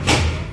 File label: door Lock, Metro